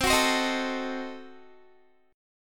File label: C Diminished 7th, Guitar; strummed; Cdim7; 4E,4A,5D,5G,5C,6F; 20,21,22,20,21,x